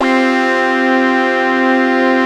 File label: QUACK CHORD; InVision Interactive; Keith Emerson; The Most Dangerous Synth and Organ